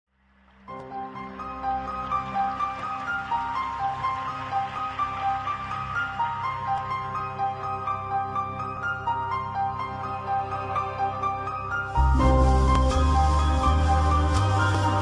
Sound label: piano melody